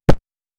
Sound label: one boppop sound like i, launcher